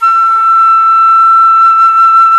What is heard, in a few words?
jazz flute